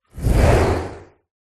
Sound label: Fire Spell Fireball; sound spark